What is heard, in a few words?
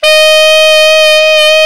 TENOR SAX; Alpine Volksmusik